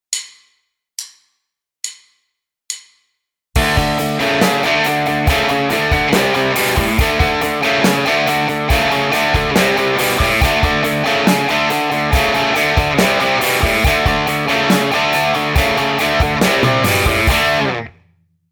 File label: Riff, guitar